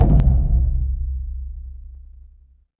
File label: magic scifi shield